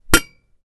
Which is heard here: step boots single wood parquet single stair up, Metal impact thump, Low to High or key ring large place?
Metal impact thump